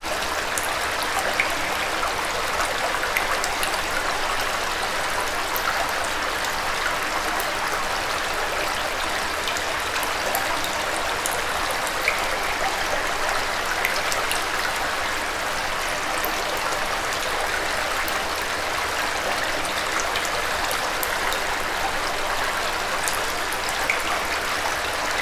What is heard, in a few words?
water sewer flowing med with drips